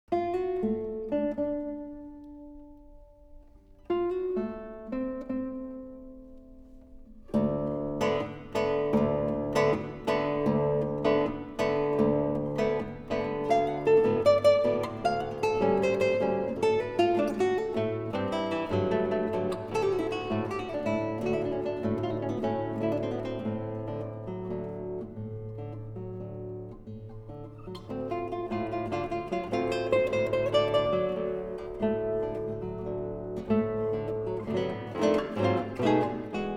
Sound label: Allegro Ben Ritmato E Poco Rubato, Preludes